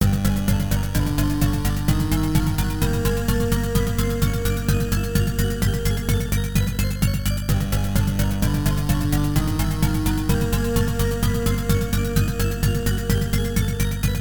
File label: boss2